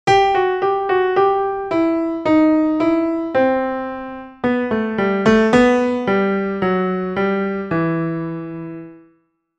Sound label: Simple Triple Time